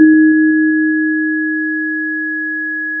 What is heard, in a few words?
Sound of a church bell